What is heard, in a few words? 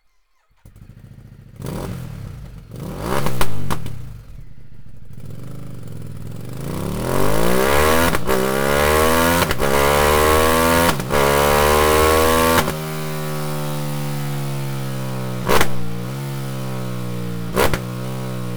Ducati Multistrada 1260